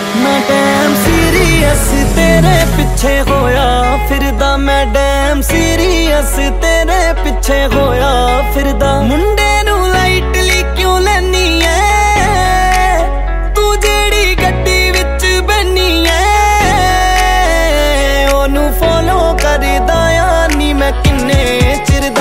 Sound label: Punjabi Ringtone